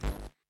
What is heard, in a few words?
Teleport Power-Off